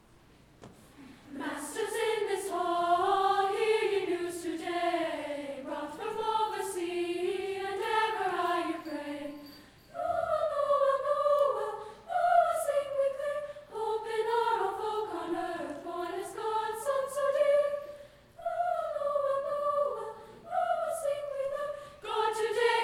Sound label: choir; millennial choir